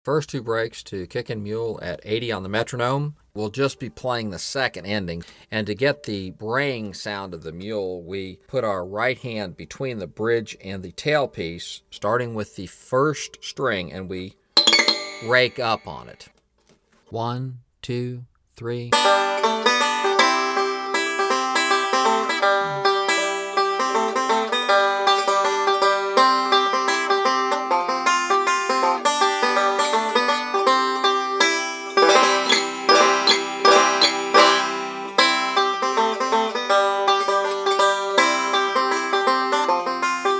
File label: Breaks 80 Bpm